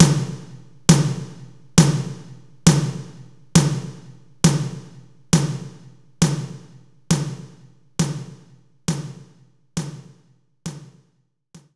Room Tom; knights try

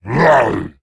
Creatures Fight Attack; Mighty Party; Android

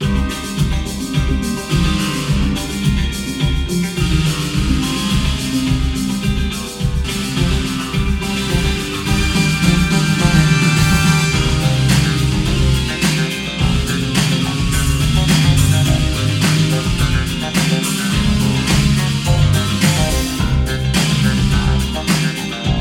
Disco Party; World of Warcraft; Auction House Dance Party; Goblin Disco Party

